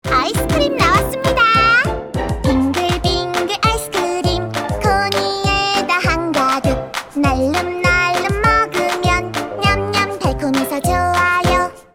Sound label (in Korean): 동요 귀엽고 아기자기한 귀여운 여아; 똘똘이 아이스크림 가게